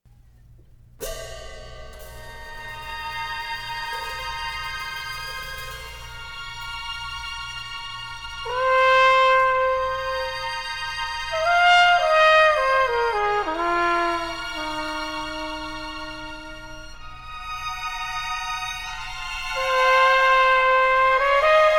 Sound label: Lonely Jazz